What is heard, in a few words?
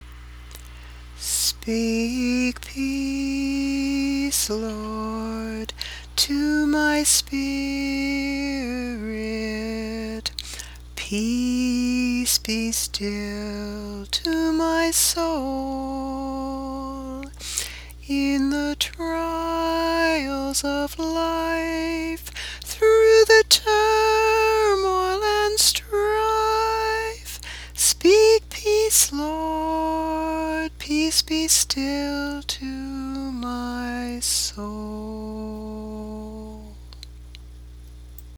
Singing Peace Be Still